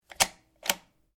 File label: RIFLE CZ 452 VARMINT 22 CALIBRE BOLT ACTION OPEN; A selection of domesttic firearms; Rifles